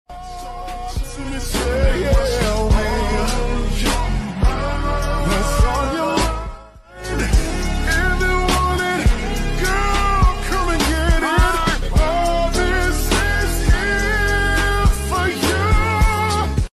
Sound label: rizz song